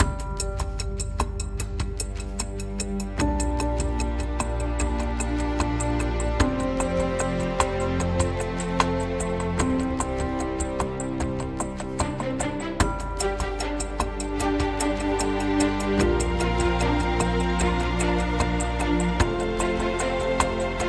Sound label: Music Perc Loading 75bpm; Pm Perc Loading 2 75bpm Game Mix